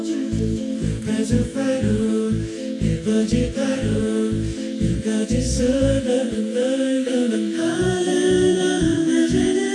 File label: a cappella chorus, bella ciao; musiclm; melody conditioning